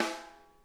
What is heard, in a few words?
RIM, snare; Sonic Reality; Interactive Drum Kits